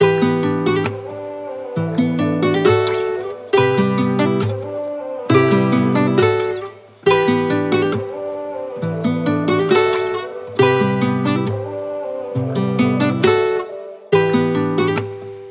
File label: Journey Guitar Loops, 136 BPM E Min; Vicious Cycle; Cymatics